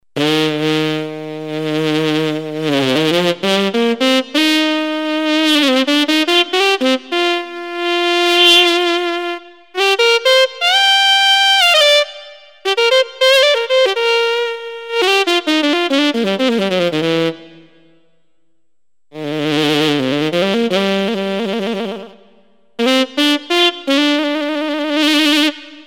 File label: Sax; Sax Model 12